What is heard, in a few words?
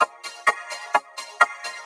Vocoder Loops 128BPM